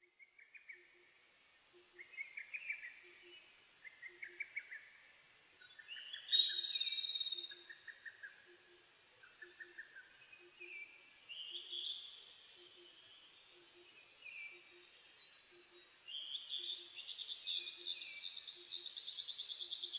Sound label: Erithacus akahige; 日光市稲荷川上流 alt=1190m; Rec.: MARANTZ PMD670 Mic.: audio-technica AT825; 他の自然音： アカハラ・ホトトギス・ツツドリ・ジュウイチ